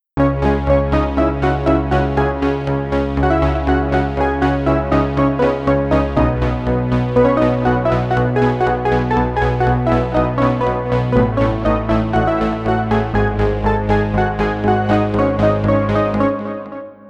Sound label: new digital age sequence